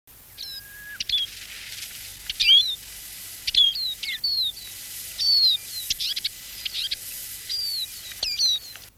sijs, trekroepjes

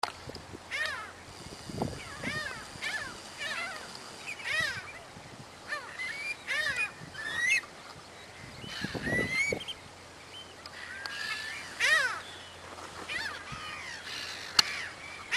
Zwartkopmeeuw

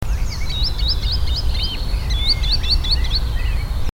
Witgat, trekroepjes; tijdens de vogeltrek

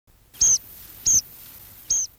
boompieper

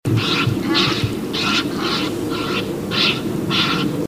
Nijlgans, trekroepjes; tijdens de vogeltrek

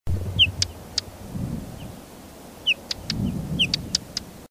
Paapje, trekroepjes

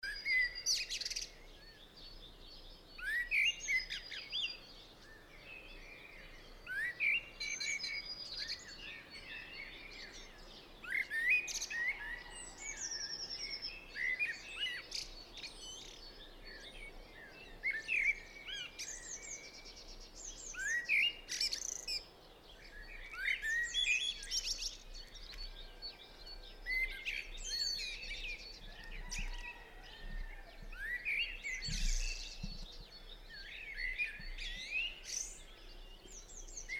Merel zingend op het dak

